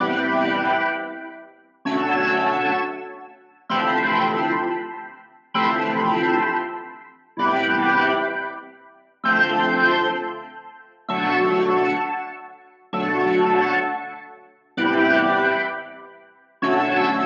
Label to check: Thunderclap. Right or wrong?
Wrong